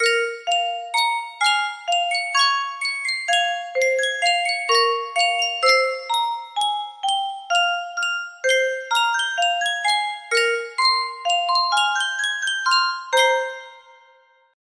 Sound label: music box, komoriuta; edo